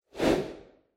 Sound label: Camera Motion, Low; カメラモーション01-02(低); シーン・カメラモーション